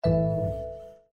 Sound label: Windows Battery Low; Cityscape